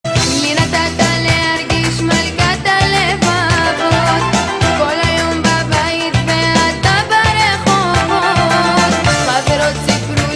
Hebrew Ringtone

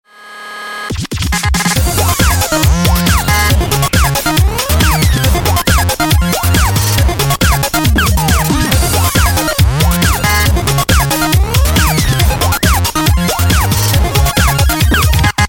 Synthesizer

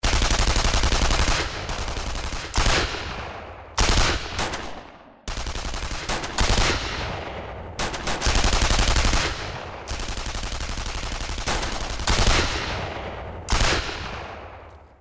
Battle Medium

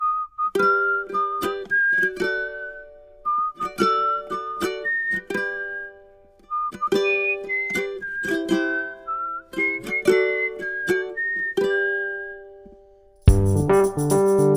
iphone 8 Birthday

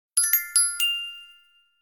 Pleasant

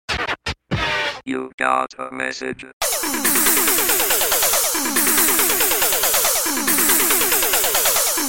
Alien Msg

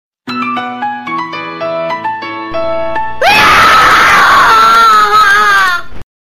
Scream; Nokia

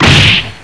Kick Strong

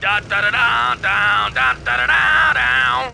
tank singing

